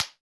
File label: SPOON, Perc Toys; Spectrasonics; Supreme Beats; World Dance